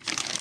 paper

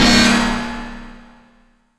Stab Crash; FREE SFX PACK VOL 2

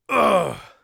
crit, Warrior Voice; Warrior Voice Pack

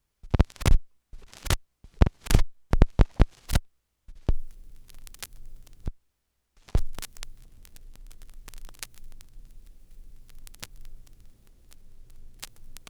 How Do You Put Needle On Record; Micro Pack

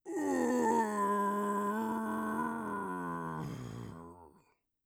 bleeding, Orc Voice; Orc Voice Pack

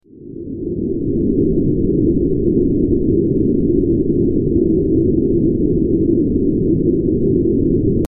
Ambient Wind; horror; horror sfx vol 1

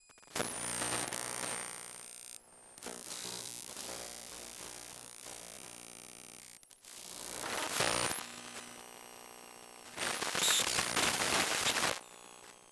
Electromagnetic Ipad Sequence; Mono; Essentials Series NOX SOUND

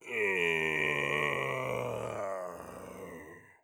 poisoned, orc voice; Orc Voice Pack

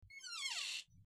Creaking Door; horror; horror sfx vol 1

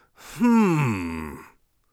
inquisitive, Warrior Voice; Warrior Voice Pack

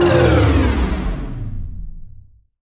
Retro Charge Off Stereo UP

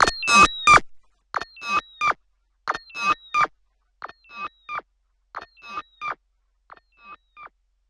Delay Call; FREE SFX PACK VOL 3

